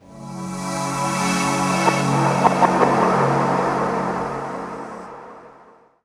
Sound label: Pad; Blu Mar Ten; Jungle Jungle - 1989 to 1999 Samplepack